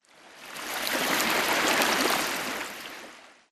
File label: swampland water lapping; Titanfall 2